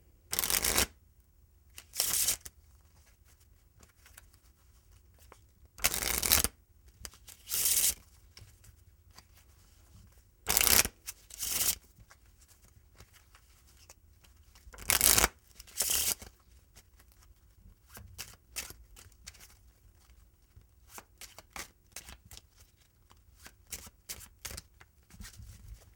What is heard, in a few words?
Shuffling Cards